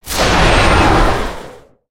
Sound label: Vanquish Head glow; Sorcerer